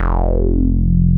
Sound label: DIGALO F2F, DIGALOGBS